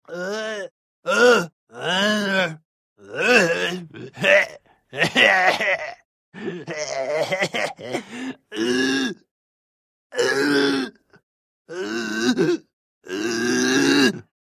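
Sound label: crazy person; sumasshed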